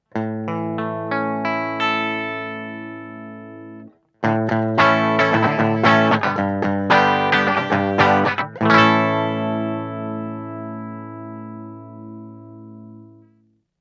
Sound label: EMG81 clean